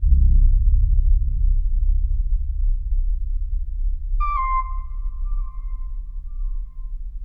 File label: Bass verb; Akai; Mule